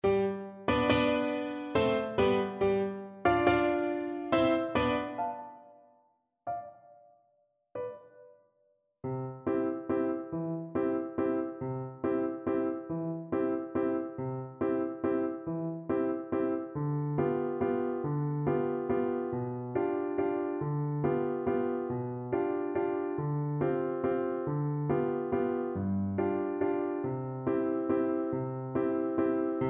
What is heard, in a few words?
horn, French Horn, waltz